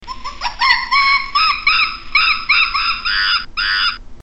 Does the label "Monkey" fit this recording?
Yes